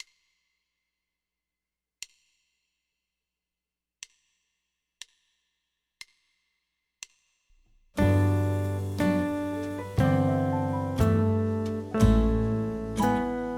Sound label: GUITAR